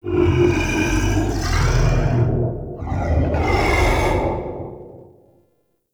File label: Root Cave Root Chatter A; Ambience; One Offs; Neathuns Root Cave